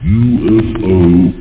ufo

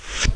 Arrow

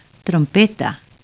trumpet

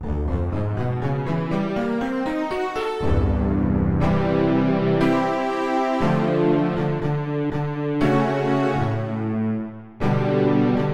Synth Blend

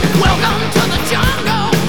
open; Tiger

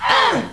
Grunt Of Life; Solarian II

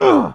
grunt; Superbike 2001

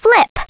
flip

